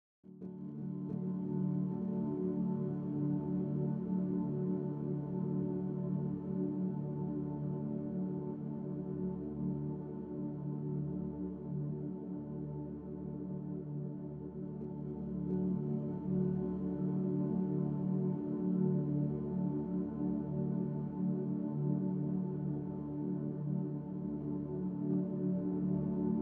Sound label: piano drowned in reverb